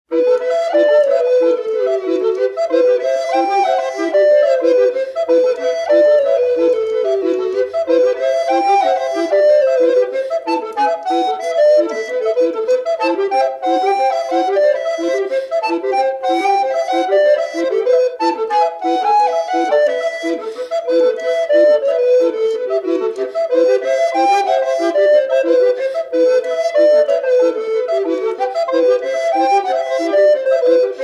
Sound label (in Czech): fletnové trio ukázka